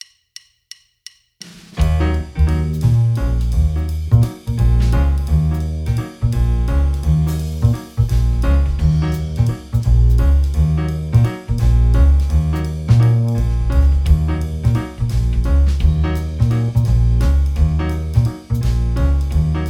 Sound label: jazz trio